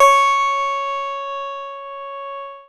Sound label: C#, Synclav FM PLUCKED; Big Fish Audio